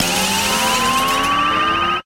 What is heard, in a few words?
Stinger; Sound Effects Bible